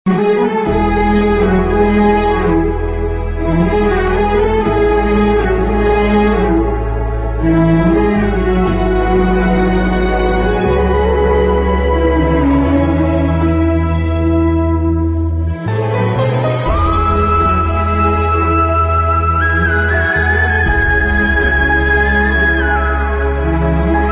kannada ringtone sad ringtone bgm tunes; mungaru male 2 movie

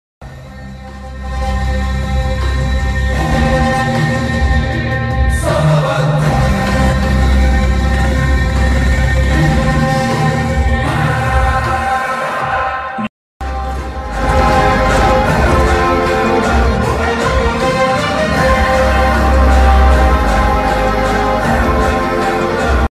hero entry bgm ringtone; saripodhaa sanivaaram movie

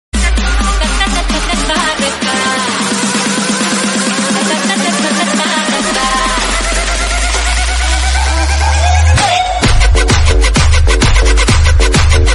dj remix ringtone, kannada ringtone; Tarak movie

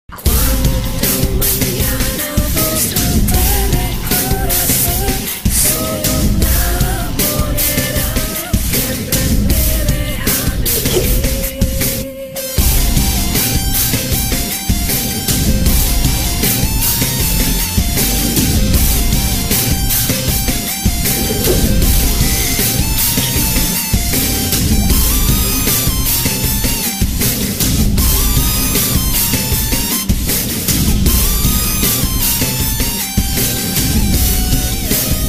Techno Theme Ringtone; manmadhan

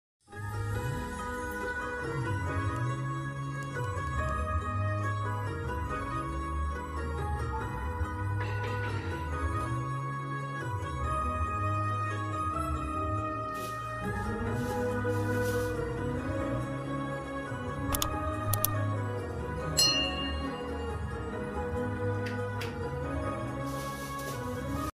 love bgm; Ooru Peru Bhairavakona movie

